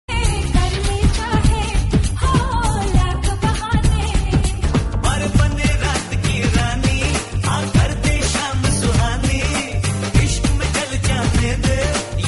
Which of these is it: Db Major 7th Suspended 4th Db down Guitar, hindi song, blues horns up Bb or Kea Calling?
hindi song